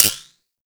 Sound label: Minigame Focus Out; DE; Warframe; Grineer